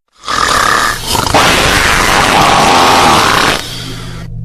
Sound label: sleeper fart